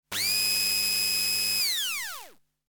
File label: Grinder electromagnetic textures